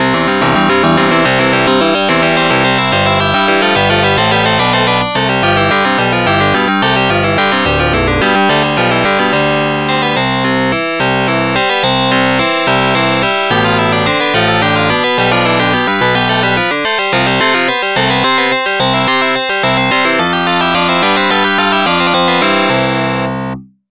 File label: Lap Clear; Makaimura; PC-8801